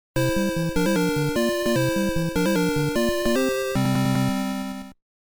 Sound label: Temple Clear; zelda the music